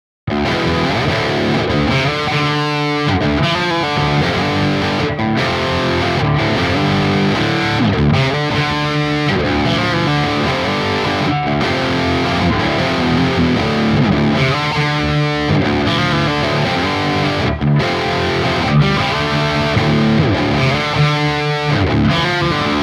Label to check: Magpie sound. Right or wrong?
Wrong